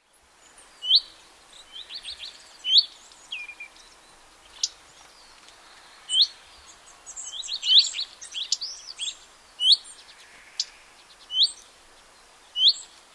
redstart

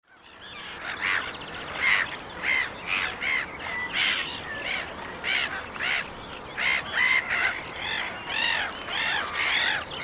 black headed gull

